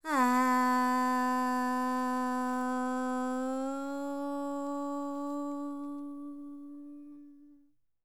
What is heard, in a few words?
World Voices, INDIA; ILIO; Vocal Planet